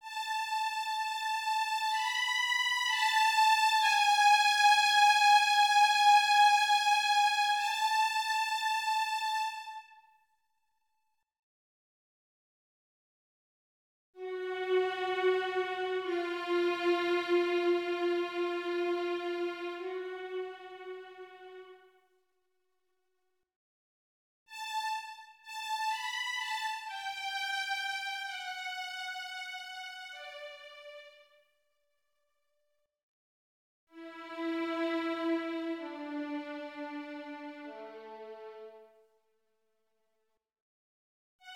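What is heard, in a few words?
Bartok For Children Violin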